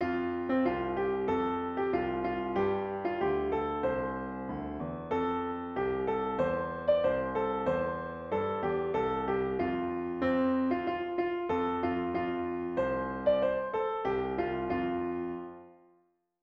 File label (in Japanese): ピアノ